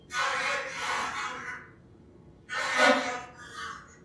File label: flies; ambience; dfcaverns